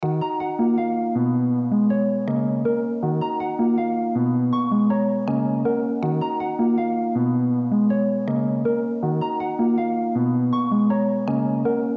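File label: D minor 160 BPM Piano Keys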